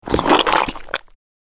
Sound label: thick slurp